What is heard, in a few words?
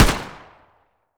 cf05 fire; weapons; garrysmod